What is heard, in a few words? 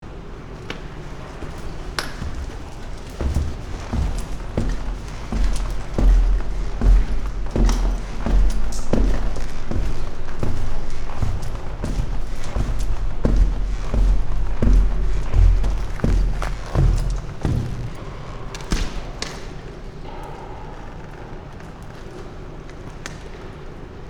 building site staircase; Walking up a staircase at the building site, splashes